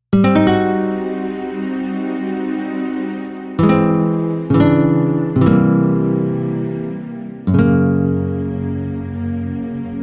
Classical Guitar Recital

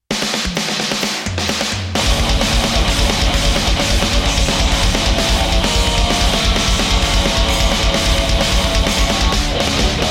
rock guitar example full band; Recording One Rock Guitar; Zystrix

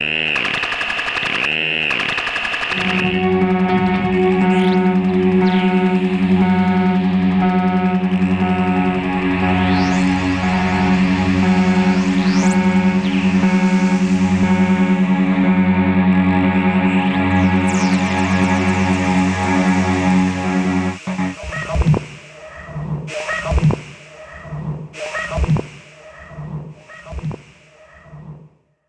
synth pads; Zystrix

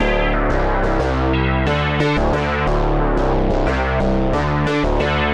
Bass loops; Zystrix

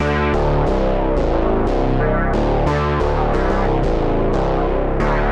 Bass loops; Zystrix

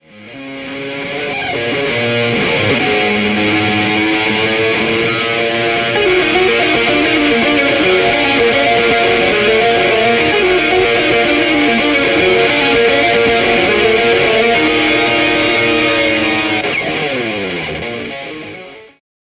cheap drone picking; extreme trills, drones; Zystrix

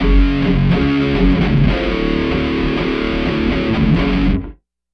guitar effect

